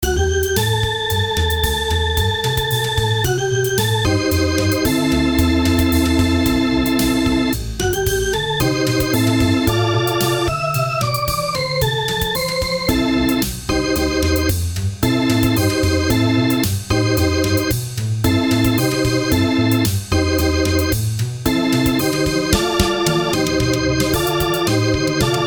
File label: Odd Disgusting Jazz